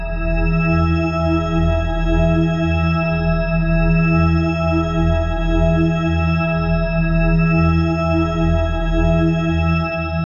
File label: Pad E Bells; Trap and Dubstep